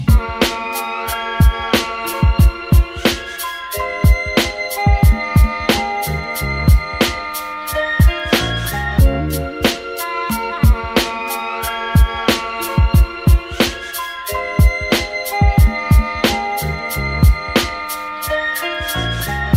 Arabic Trap Ringtone; Samsung

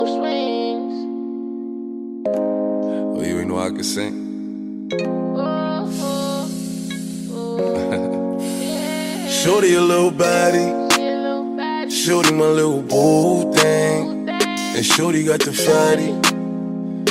Ringtone

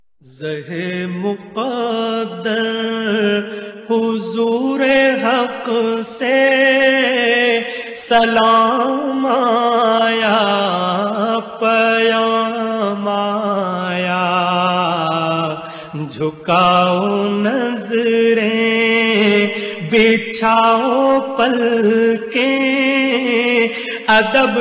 Islamic Ringtone

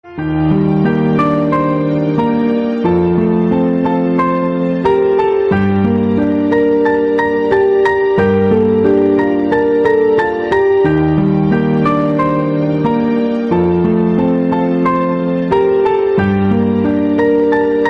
Piano Music Ringtone

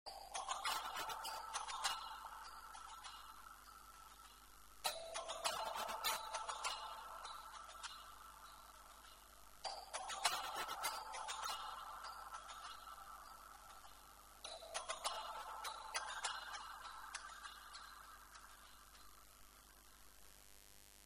HTC Ringtone; HTC Desire 626

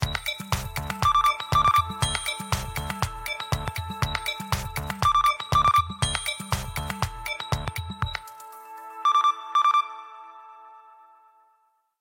Basic Bell Ringtone

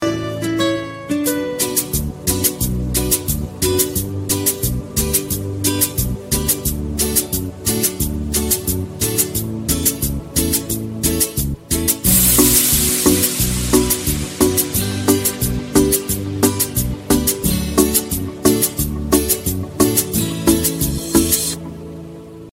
Music Ringtone

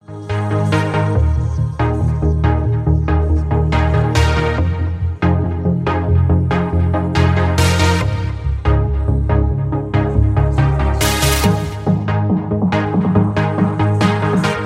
Beautiful Tone

